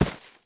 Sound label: bodyfall grass, foley